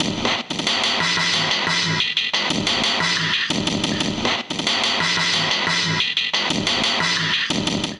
Distorted Future Kit, glitch and wash drums; Ableton; Factory Packs

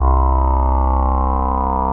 bass

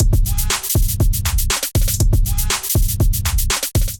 Castle Kit; Drums; Ableton Factory Packs; Beat Tools

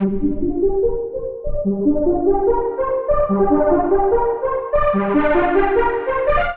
Lead D Minor 146 bpm; MIDI Clips; Ableton; Factory Packs; Skitter and Step

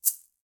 Maracas; Latin Percussion; Drums; Drum Hits; Shaker; Ableton; Factory Packs

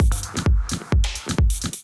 Kit 130 bpm; MIDI Clips; Drums; Maxi Lachs Kit; Ableton; Factory Packs; Skitter and Step

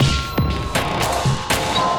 Streamed Kit, drums; Ableton; Digicussion 1; Streamed Kits; Streamed Kit 03

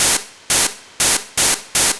IDM Perc, IDM Percussion; Ableton; Factory Packs; The Forge by Hecq

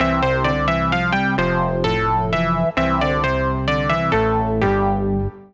SynKeys (C) 130bpm; Music Loops; Logan; Ableton; Singularities

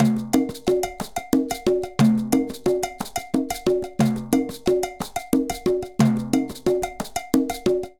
Fusion 6 8 A 120bpm, Latin Percussion; Ableton; Factory Packs

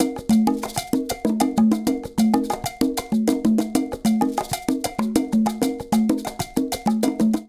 Bembe 6 8-128bpm, Latin Percussion; Ableton; Factory Packs

